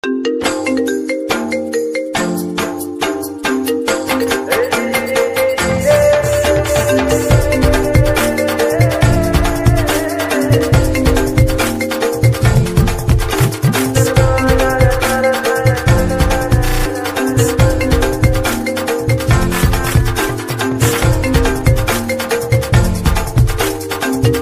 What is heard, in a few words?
iphone garba mix